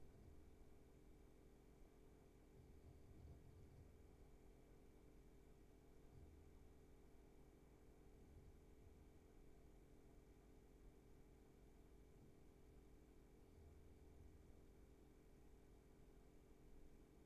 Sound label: room tone